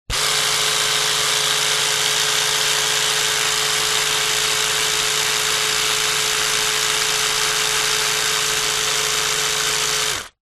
household razor electric male trimmer operate run dry; remington